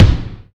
bass drum single hit g key, Royality free kick drum tuned to the G note.